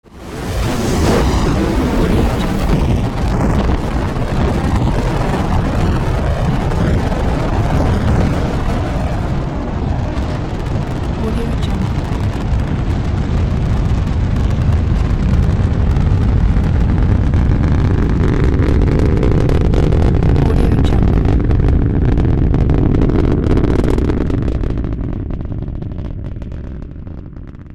rocket launch; darksidemarket

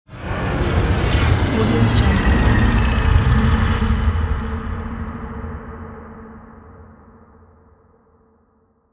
scifi passing by

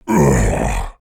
demon pain dying; aaa game character; esmteam

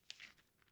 magazine rustle

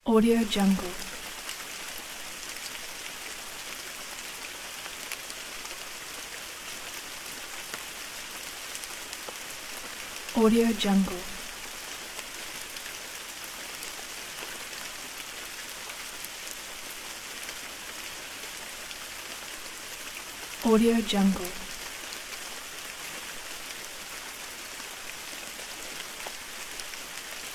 gentle rain

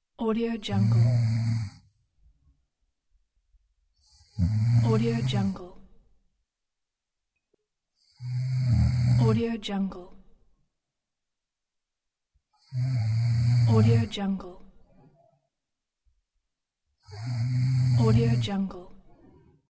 snoring

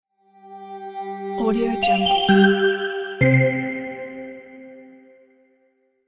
airy elegant logo; toshnovel

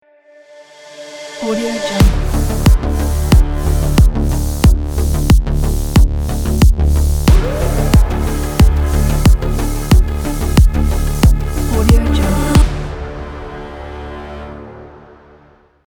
sport car logo

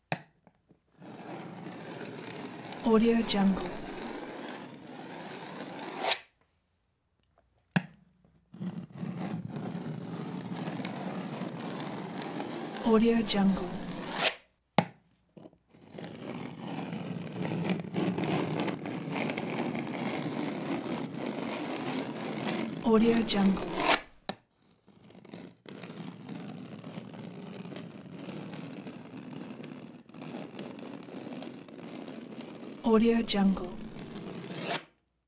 scrape wall scissors blades against plaster

